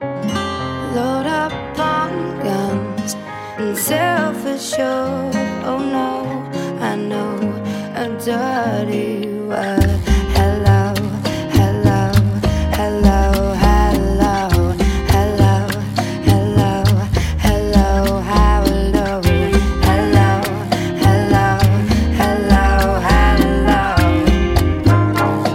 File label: Rumba